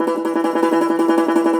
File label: cumbus saz E, plucked strings